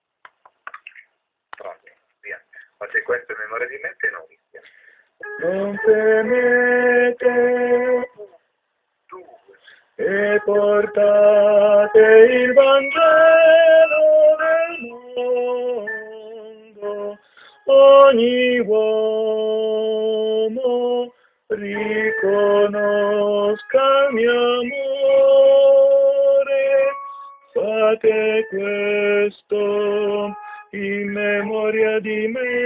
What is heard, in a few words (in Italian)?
tenori voce